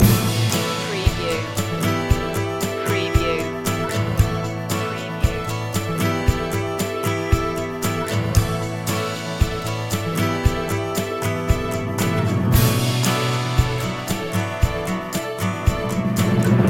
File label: good morning guitar loop